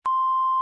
EBS Test Loop; Test Tones; 1001 Sound Effects

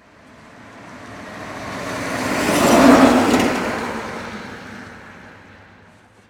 car drives fast, street traffic; best service Blue Box

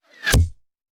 Bullet Impact; Sound Ideas; Guns

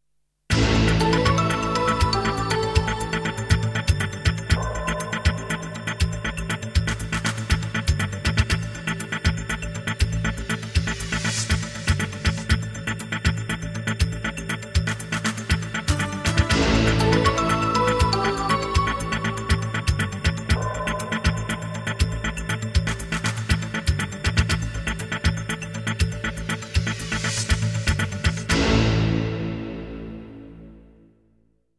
jingles; 90 DDD Jingles

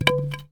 Baseball Bat Fall

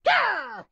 grandma knife waaa; Blind Drive

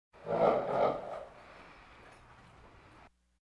Animal effects snorts grunts farm ambience

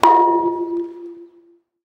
Baseball Hit Metal Pole

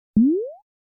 cartoon drip; NCH Library

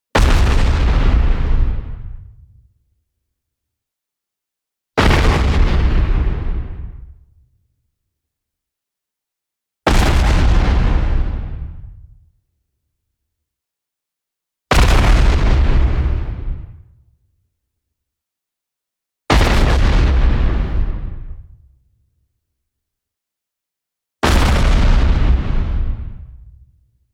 EXPLOSION LONG Distortion White Noise Medium; boom library; Anime Essentials

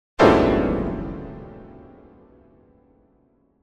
Piano Harsh Jarring Chord; Hollywood Edge; sound designer's toolkit v2

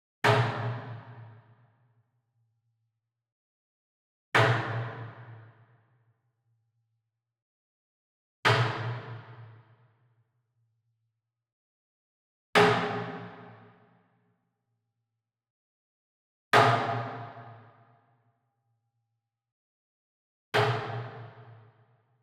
Wood Percussion Log Drum Traditional Hit Far; boom library; Cinematic Strikes Construction Kit

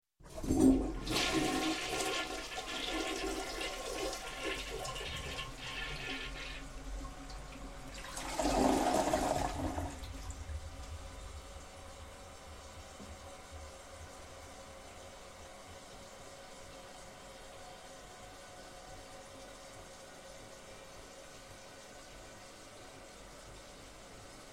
Toilet Flush And Fill; Sound Ideas 2000 Series

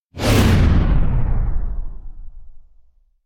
Whoosh Low And Fast Pulsating Going Down; Hollywood Edge; sound designer's toolkit v2

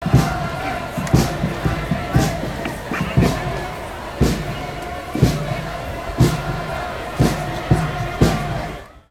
revolution drums; best service Blue Box

